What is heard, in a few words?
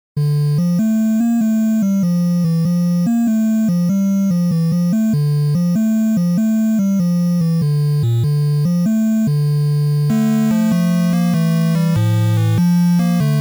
8bit outside on a windy night